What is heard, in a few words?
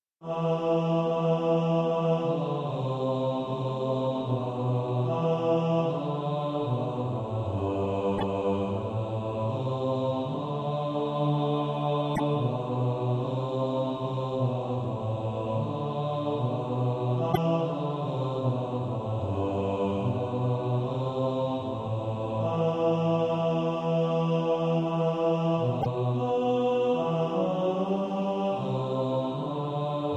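bass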